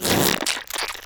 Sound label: fart gurg